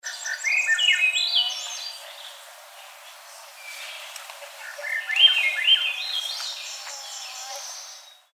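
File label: Swainson's Thrush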